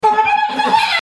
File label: sound attack; alvamajohaters juego